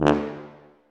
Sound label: TUBA C1, Solo Brass, stacc; Roland LCDP12